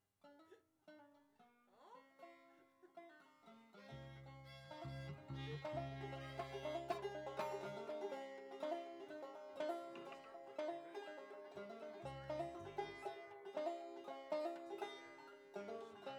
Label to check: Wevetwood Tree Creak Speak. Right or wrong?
Wrong